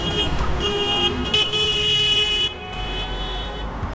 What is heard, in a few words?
crowd noise in the city; busy